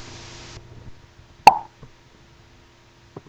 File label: pop